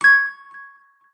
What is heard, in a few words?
Sfx Basketball Fever